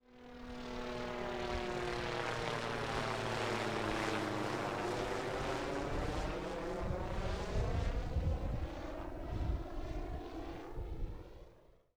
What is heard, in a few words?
Airplane; vehicles